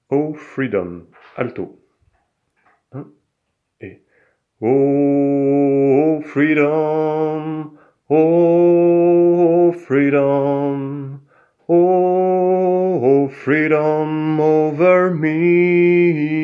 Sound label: O freedom Alto